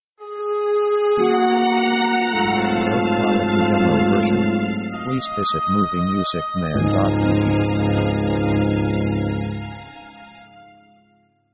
american tear jerker; orchestra themes

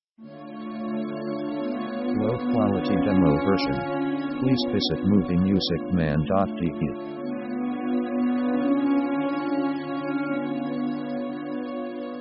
orchestra romantic slow; orchestra themes

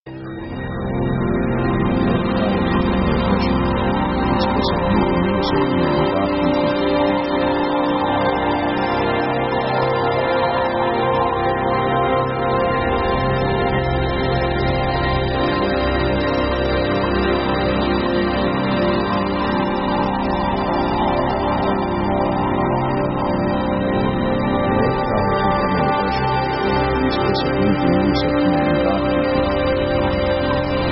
background flow; synth themes

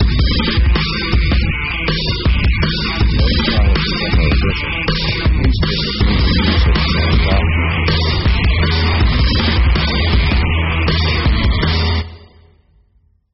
metal breakbeat

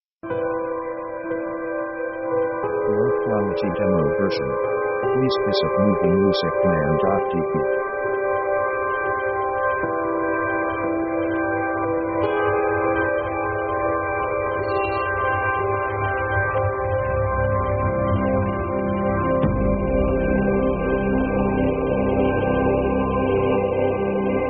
atmosphere cinematic; Background themes

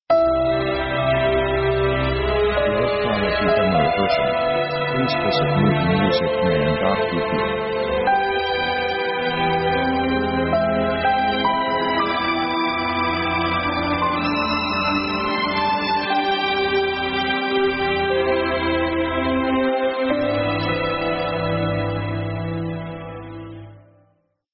orchestra romantic intro; orchestra themes

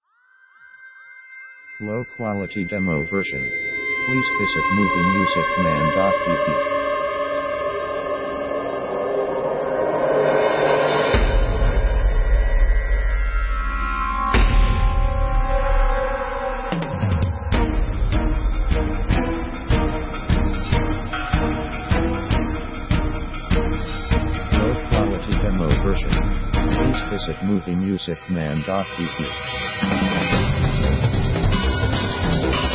orc action popular; synth themes

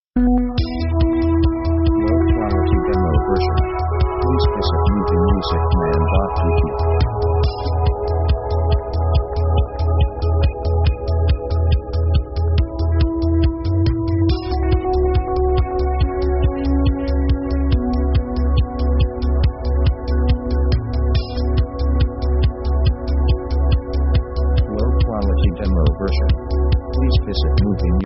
Rhythm 140bpm; synth themes

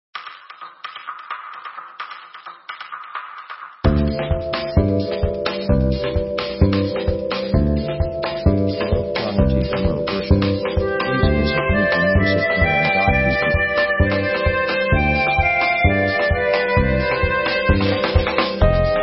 Simple Song; synth themes

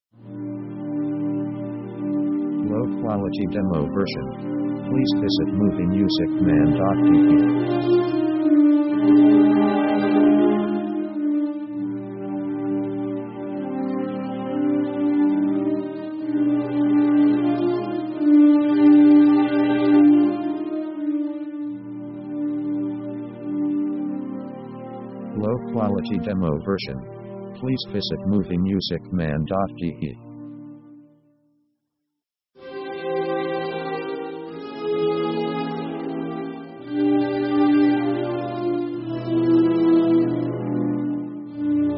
orc love theme american; orchestra themes

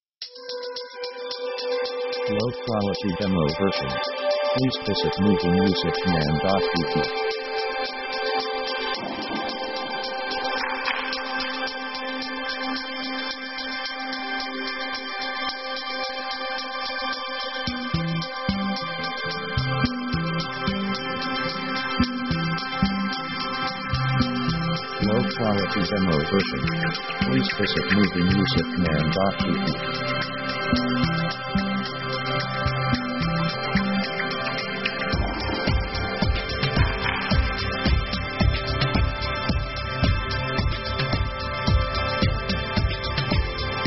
smooth background light; synth themes

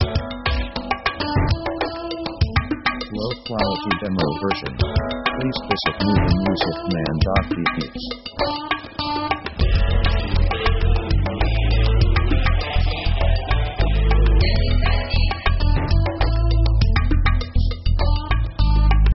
pop 100bpm upbeat; power themes

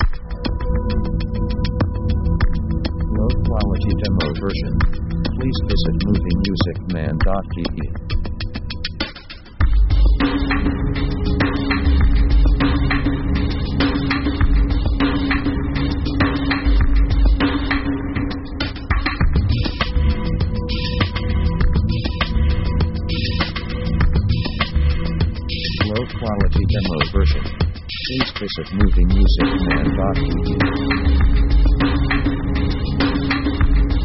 synth atmo dark; Dark mood

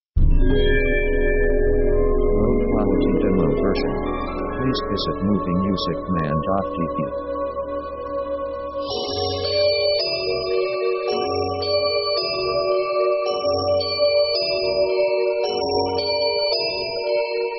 orc mystic fantasy; orchestra themes

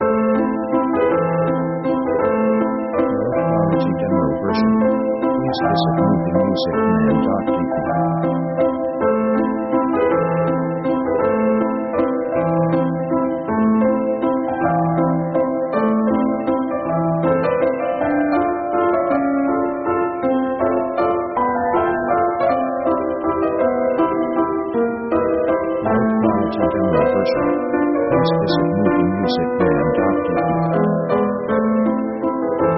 piano russian walz; melancholic; melancholic themes

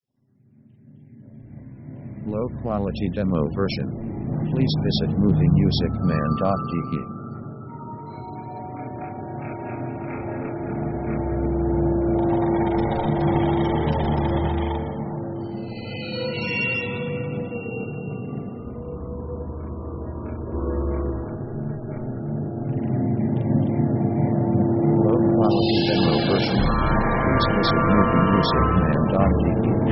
orc mystical

